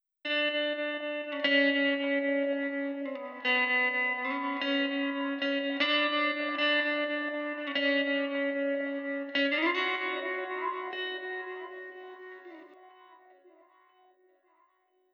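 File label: processed echo guitar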